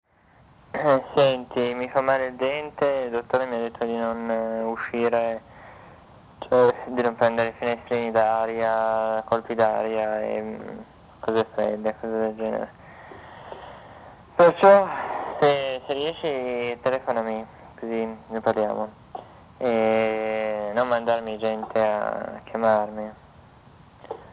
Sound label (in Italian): beat finestrini aria